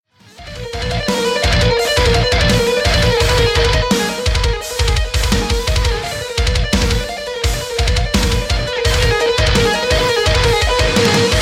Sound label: drums; panda sound; shokran